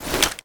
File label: foley generic draw weapon